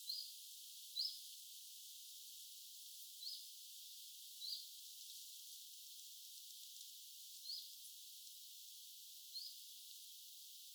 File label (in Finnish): tiltaltti eli ilm nuori vuoritiltaltti